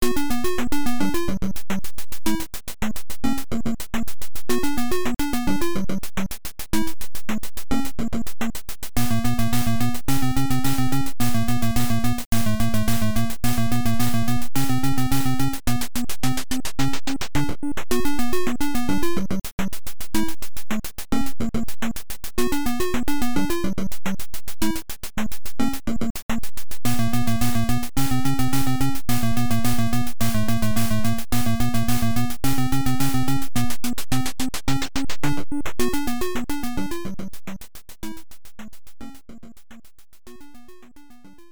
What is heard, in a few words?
Cave; Les Chevaliers du Zodiaque La Legende d'Or; NES